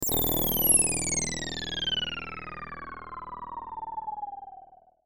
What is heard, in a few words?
game over